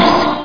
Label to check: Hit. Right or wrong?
Wrong